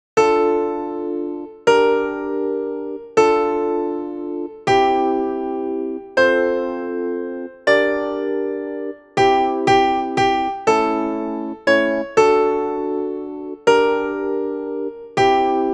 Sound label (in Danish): sopran